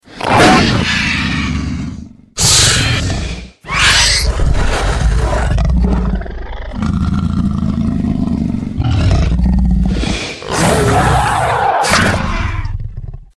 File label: ringtone